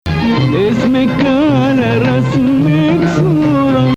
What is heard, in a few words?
Bayati Husseini Nahawand, Arabic music; could also call "Husseini/Nahawand"--emphasis on 5; Nahawand 4